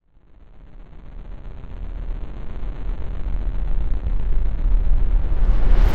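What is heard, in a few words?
Reverse Metal Claw, Cinematic Metal Impacts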